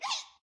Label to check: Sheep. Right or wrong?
Right